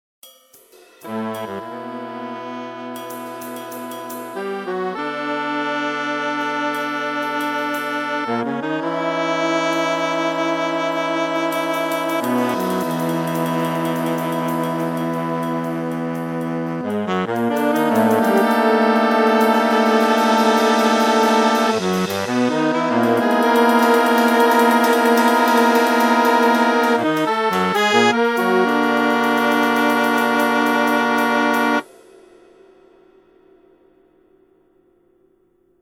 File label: Pad 5 Layer detuned